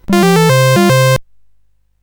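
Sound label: Number Tumbler, MOS 8580 SID chip music, games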